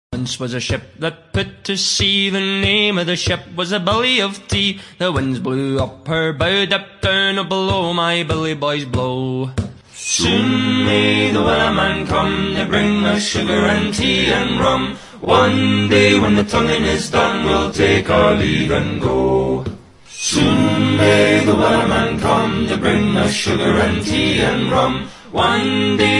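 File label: sea shanty, ringtone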